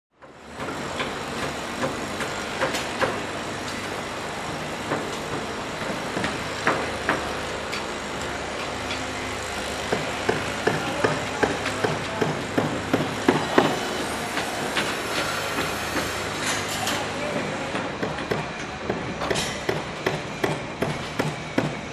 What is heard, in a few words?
Busy Construction Area Ambient